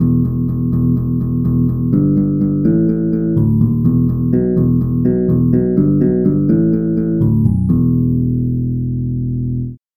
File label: harp bass